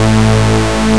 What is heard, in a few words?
electro; awe32